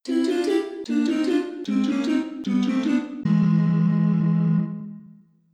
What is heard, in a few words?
Level Lost